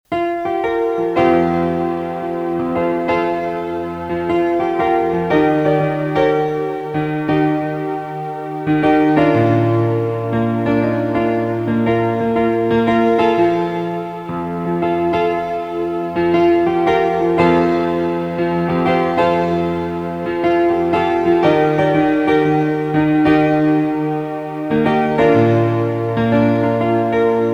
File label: PIANO PAD